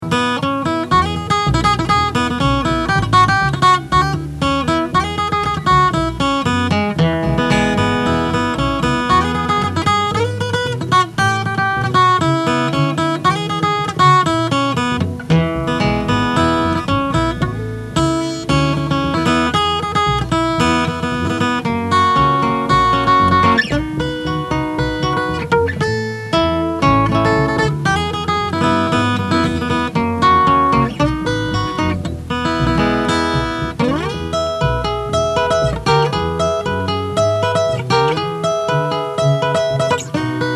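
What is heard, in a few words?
steel guitar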